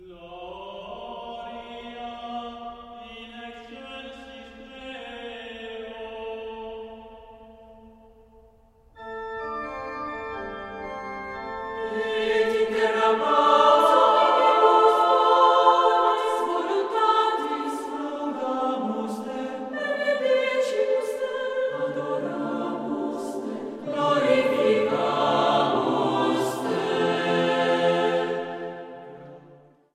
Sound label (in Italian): voci